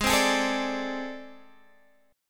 A Minor Major 7th Double Flat 5th Ab

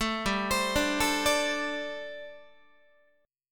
G Suspended 2nd Suspended 4th, Guitar; Gsus2sus4 arpeggiated; JGuitar

